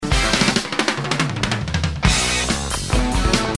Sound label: Fill, Drum Sounds